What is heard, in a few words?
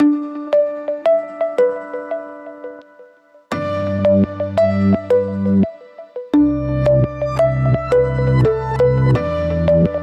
Firefly, ringtones; Samsung Galaxy S7 Active